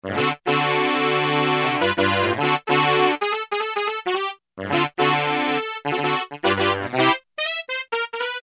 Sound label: Detune Sax, Roland U-110; Factory Patch